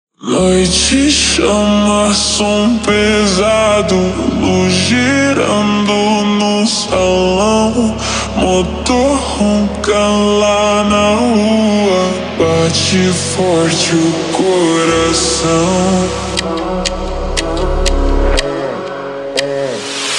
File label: super Slowed, funk